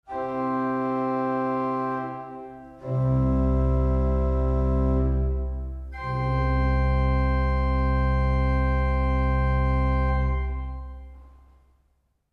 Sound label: Prinzipal 8